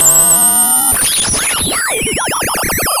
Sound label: pop, Sound of a cat meowing